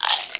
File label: CRUNCH; dogz